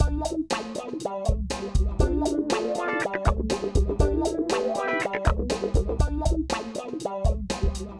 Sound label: funk, loops